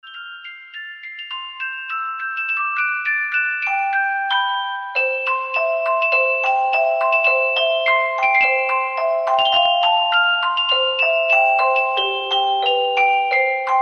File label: Jinglebells; war of the immortals pc gamerip